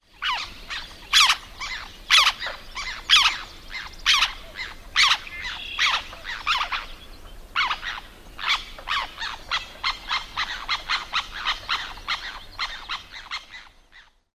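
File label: Black Tern typical calls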